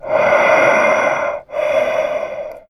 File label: shape breath; tf; slender